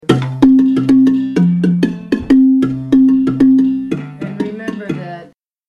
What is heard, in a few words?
bar low, marimba